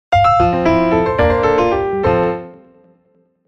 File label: logo piano